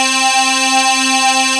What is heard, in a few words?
RICH WAVE